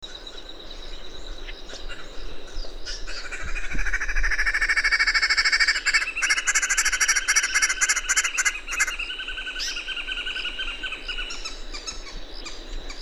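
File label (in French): irrisor moqueur, phoeniculus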